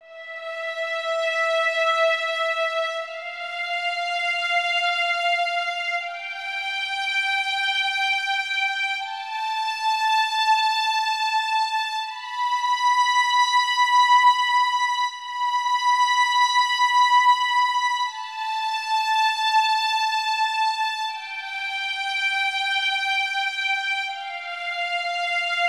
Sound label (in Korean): E선 연습, 바이올린; violinmethod